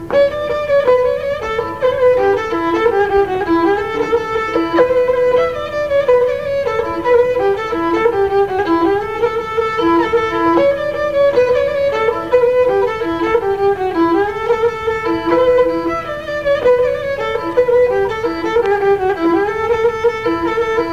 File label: Pandalash line#4, Gadulka; Lesson Recordings; Nikolay Kolev - Gadulka Lessons